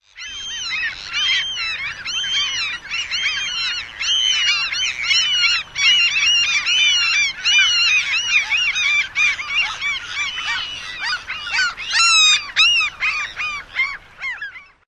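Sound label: flock calls, mew gull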